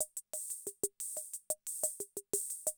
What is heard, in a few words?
CR78, DrumLoops